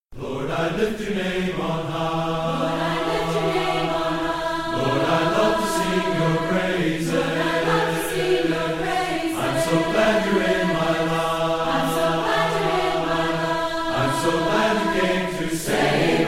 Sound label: choir